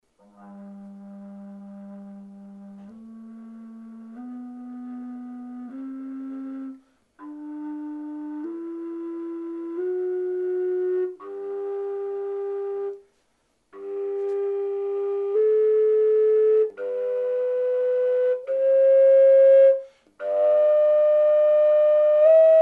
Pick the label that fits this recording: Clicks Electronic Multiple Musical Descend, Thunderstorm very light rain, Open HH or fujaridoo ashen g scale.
fujaridoo ashen g scale